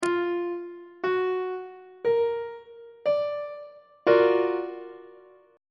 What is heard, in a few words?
g flat augmented major 7th 3rd inversion on treble clef